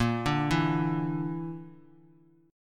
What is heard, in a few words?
Bb Major Flat 5th Bb down Bass Standard, chord; arpeggiated; JGuitar